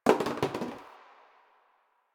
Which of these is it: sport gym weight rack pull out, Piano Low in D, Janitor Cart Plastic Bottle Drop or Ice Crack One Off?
Janitor Cart Plastic Bottle Drop